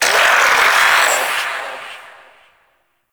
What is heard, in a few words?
Shadow Vocal Death; Warframe; Duviri